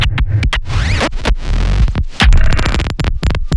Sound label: Glitch Loop